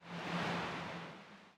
Movestep; Likho

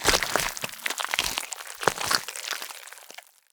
Death FAN

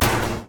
Drone; Rabbids Multiverse

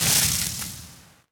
Electricity

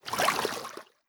Water splash

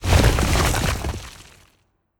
Debris

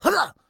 Water Splasher; Rabbids Multiverse

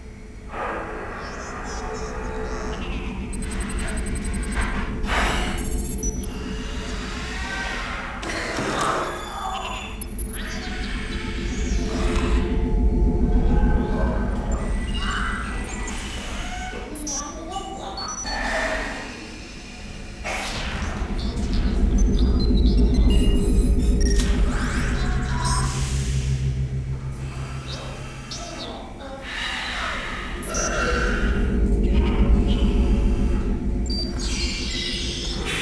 Ambient Lab; rayman raving rabbids playstation 2; Prototype Ambient; FPP Lab

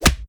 Pirate Barrel

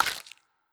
Futa Harras Bite

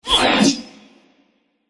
Tennis

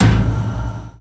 Ui Countdown; Rampage

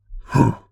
effort; Lithos

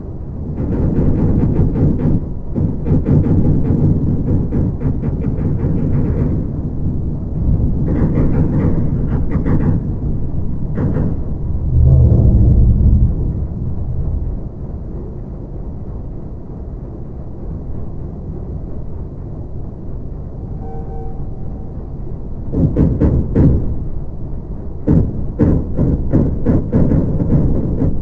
Amb Submarin

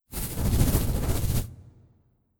Abyssalflame Whirl FIRE

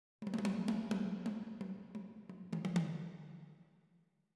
Perc

